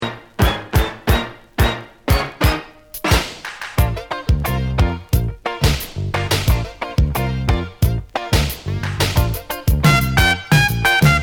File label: Reggae Trumpet; roots; vinyl